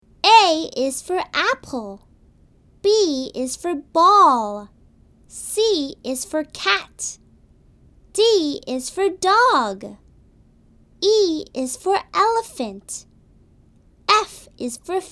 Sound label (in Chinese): 童声女 字母歌; 24字母歌